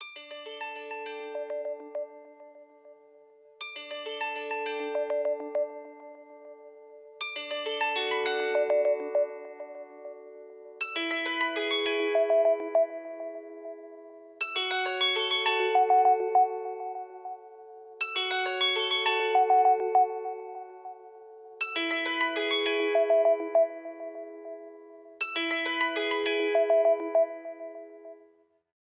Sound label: Env Dawn, ringtones; LG; Velvet